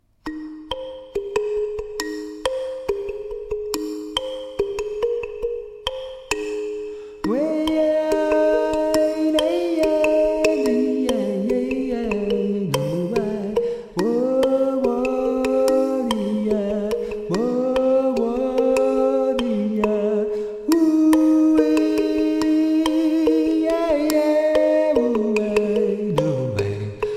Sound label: Kalimba